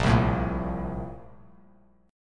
A sharp Major 7th Suspended 2nd, Bass Standard; A#M7sus2; strummed; JGuitar

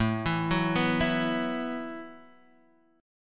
B Suspended 4th A, guitar; Bsus4/A arpeggiated; JGuitar

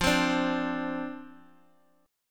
F 5th 6th F, chord; F56 strummed

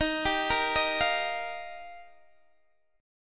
D sharp Major Add 9th, Guitar, DADGAD

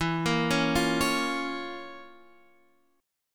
F Major 7th Suspended 4th Sharp 5th E

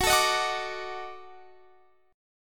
Eb Minor Major 7th Flat 5th Gb, chord

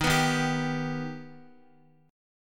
Eb Augmented Eb, Guitar; Eb+ strummed; x,x,1,4,3,2; JGuitar

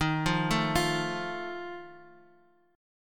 D sharp Suspended 2nd Flat 5th, chord

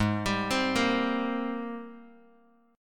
Ab Major 9th Ab down Bass Standard; AbM9; arpeggiated

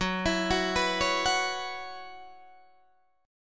F sharp 7th Suspended 4th, Guitar, DADGAD

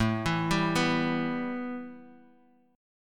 B 7th Suspended 4th Sharp 5th A

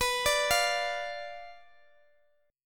B Diminished B down Guitar Half Step Down

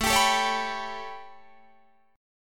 A 9th A, Guitar Open D

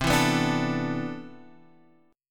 C Major 13th, Guitar Open F, chord; strummed; CM13; x,7,4,6,5,4

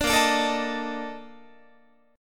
Bb Major 11th Bb Guitar; strummed

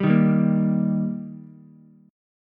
D sharp Major D sharp Ukulele, chord; strummed; JGuitar

